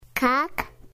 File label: truck